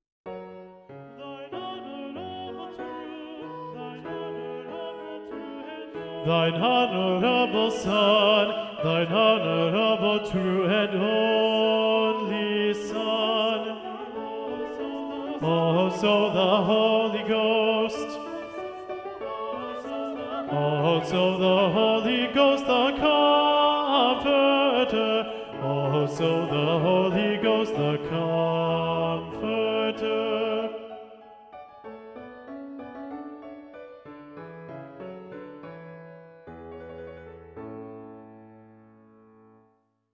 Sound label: Bass; Handel; Te Deum